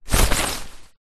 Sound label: tent movement hand on side wall inside sheet; foley